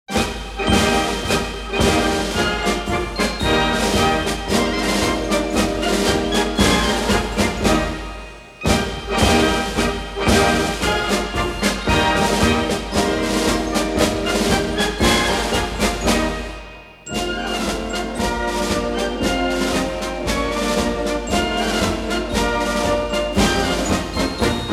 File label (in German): marsch, militarmarsche, luftwaffen musik korps; deutsche militarmarsche